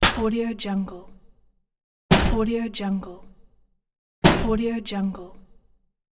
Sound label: metal can hit